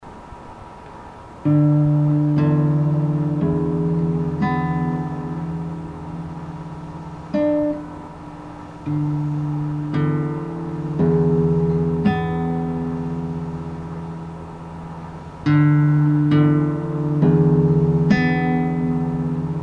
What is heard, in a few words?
Guitar